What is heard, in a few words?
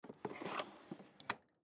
Cabinet Dining Room, Cabinet Dining Room Drawer Close; Cabinet Doors; Household; Blastwave